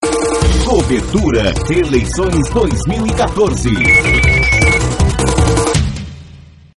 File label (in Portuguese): vinheta